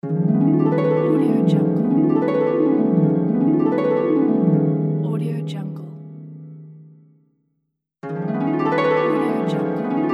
mysterious magic harpeggio; mountainaudio

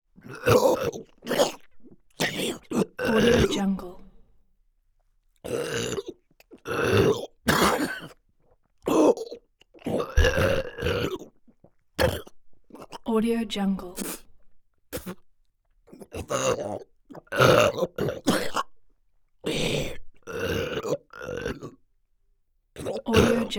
vomit constant dry coughing up air

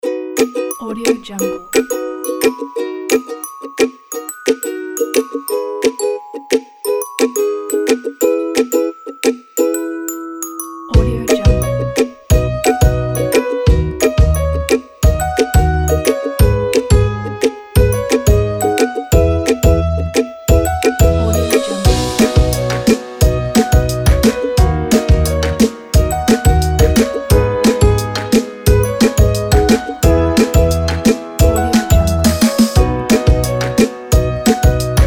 funny children game

